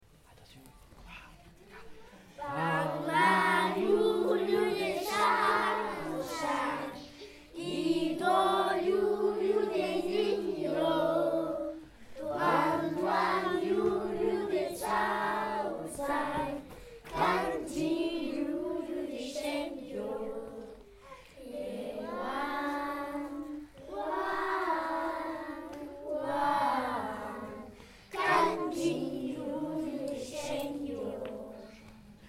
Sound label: Chorale; Auberbabel; Pao ma